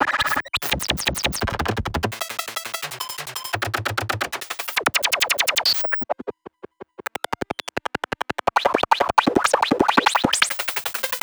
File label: perc lifeforms glitches, percs glitches and layers; Polyend; Recue